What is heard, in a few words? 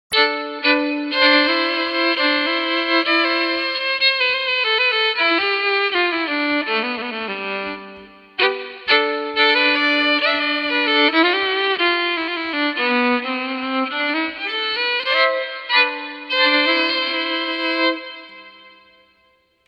Fiddle; Quilter Steelaire Pro